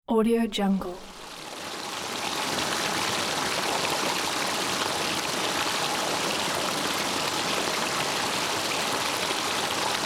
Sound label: river